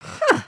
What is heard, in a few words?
curious, emotes